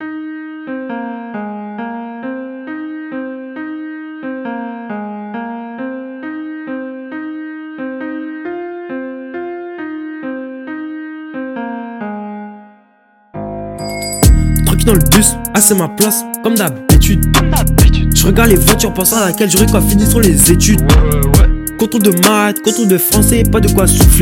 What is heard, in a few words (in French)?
Rap Complet